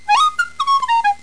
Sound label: FLUTE; graal